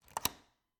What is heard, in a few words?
Cabin, front door, deadbolt, lock, latch, thick, close, hyper; Eiravaein Works; Vaeyan IV